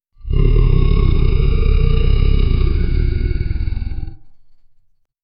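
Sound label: deep guttural growl, creature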